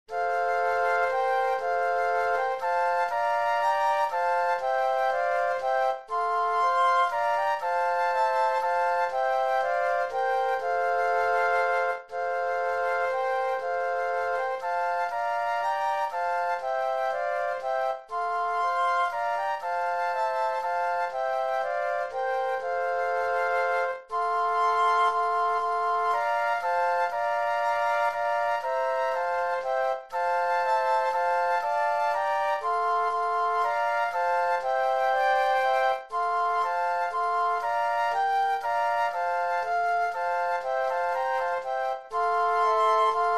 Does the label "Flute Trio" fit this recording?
Yes